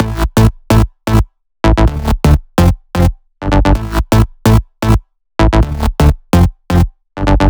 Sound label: Melody Kits 128BPM Root A; Vengeance Samples